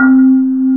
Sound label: xylophone; sc88pro